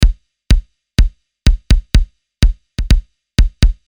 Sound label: Beat Dissected Chicago